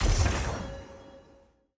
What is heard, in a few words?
ui mythic button click